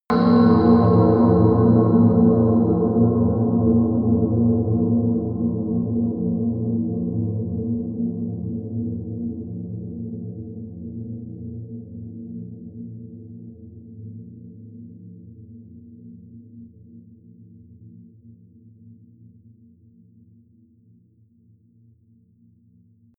sub metal impact deep long, hits impacts

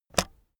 keyboard external space release heavy; kommunikation

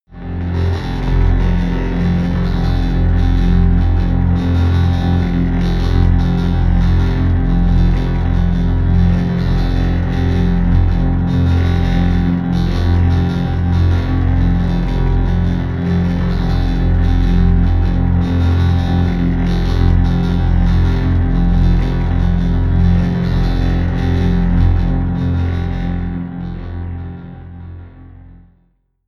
drone erratic pulse

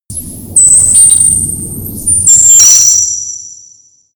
alien cosmic birds machines high; aliens

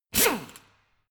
impactor short small; maschinen; bohrer

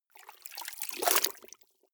clothes water updown soft, haushalt; haus garten

